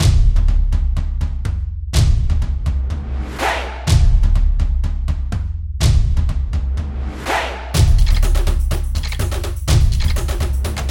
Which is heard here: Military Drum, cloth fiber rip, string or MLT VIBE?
Military Drum